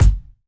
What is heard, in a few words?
kick